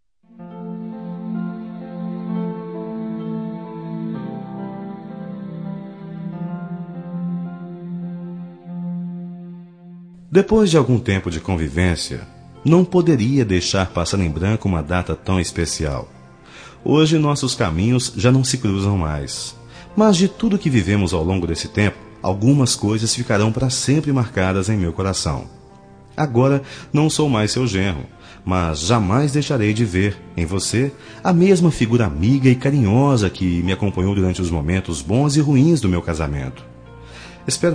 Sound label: Instrumental